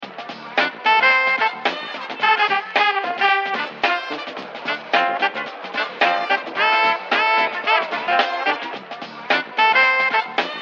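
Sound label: Funky Old Funk, ringtone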